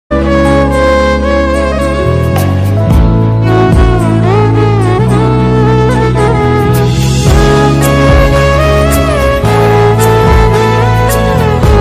love bgm ringtone; mouna ragam

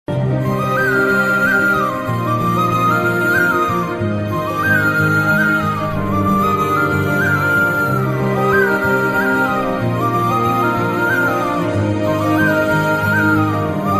sad bgm ringtone; don; father sentement bgm; climax bgm

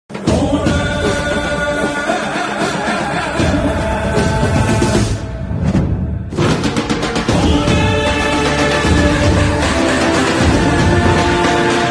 intro bgm; rrr

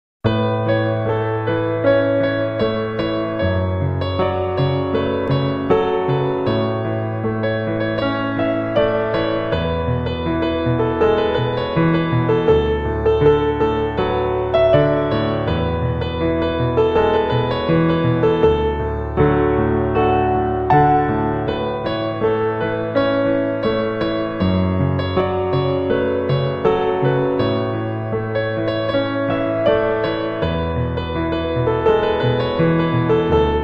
piano instrumental viral ringtone love ringtone

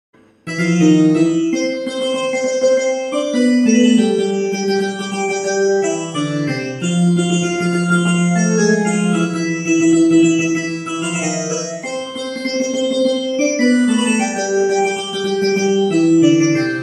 benjo tune benjo music piano instrumental; Karma theme music

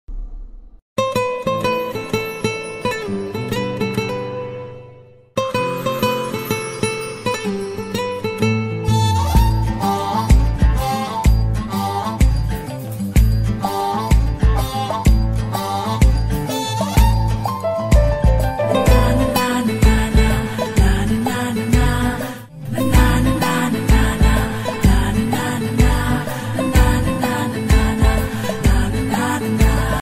instrument ringtone

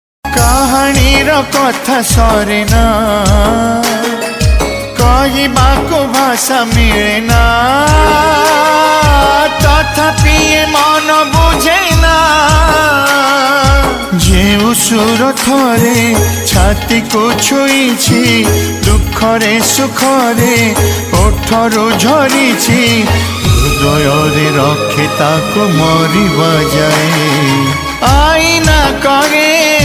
odia Jagannath Bhajan

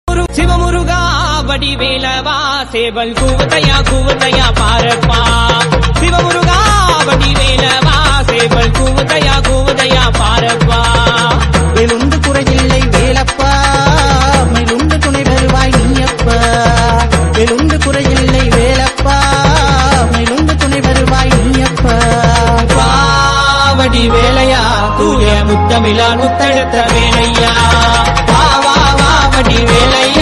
murugan ringtone devotional ringtone; siva muruga

